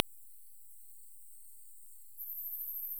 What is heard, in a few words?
Kerivoula papuensis